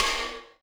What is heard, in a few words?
Splash Acoustic Dirt